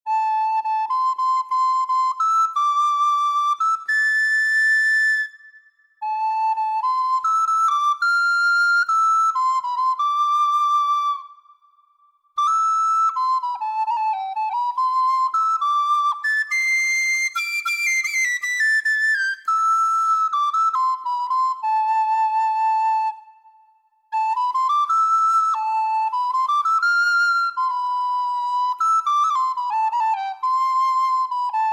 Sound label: Flute; Marcels Flute Shop